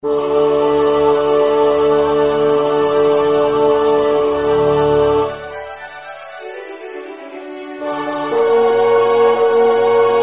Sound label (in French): Basse; Haendel